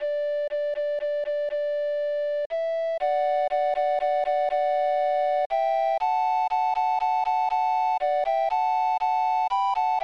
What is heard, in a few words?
minuet; lord downes; Crawshaw ms